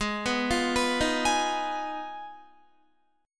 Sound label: Ab Minor 6th down, Guitar; arpeggiated; JGuitar